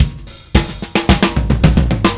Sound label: Drums Fill, Indie Rock